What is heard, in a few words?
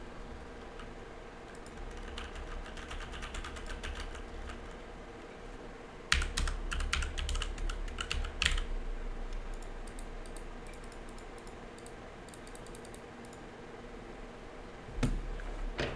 backnoise; Ten2Zero